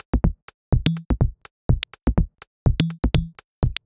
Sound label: Top E 124bpm, House Drumloops; 90 s Sample CDs; Zero-G House Fabrik Drumloops; ZG Drumloops